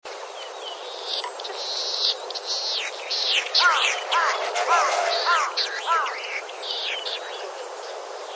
ojisigi

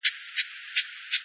uguis

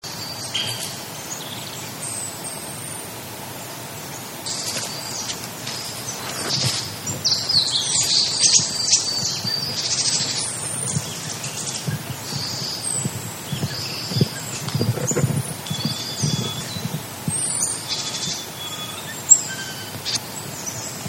kibita

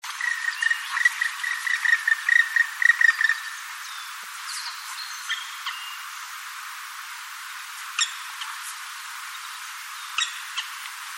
kumagera

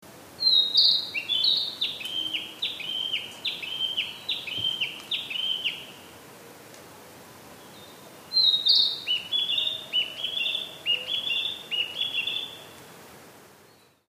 kibita

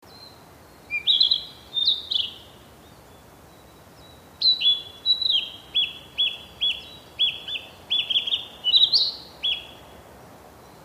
kibita

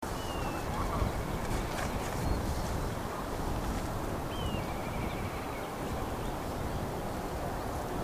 tobi

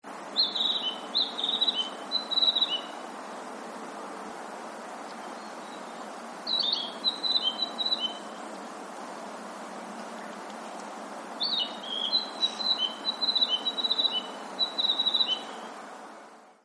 kibita

